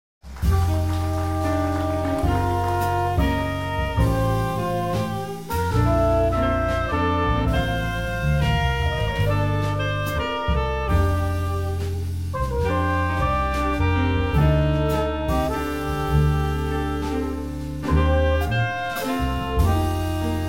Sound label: jazz 5tet